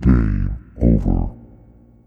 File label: Game Over; Feel; NiceVibrations; AHAPPresetsDemo